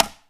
Bongo A, Pecussion Hits; Zero-G Jungle Warfare 1, 2 & 3; 90 s Sample CDs

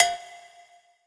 Agogo HI, percussion reverb; MARKYDRUMS

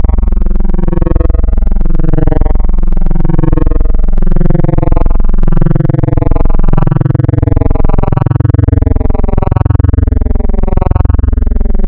Spectral, wavetables; Architecture 32

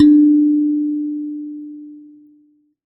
Finger, Prepared Kit; Battery 3 Sample Kits

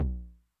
Low Tune Med Decay, 909 LOW TOM; classic machines; LT - LOW TOM

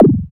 BD, Synth; Sound & Vision - Gigapack II CD 2

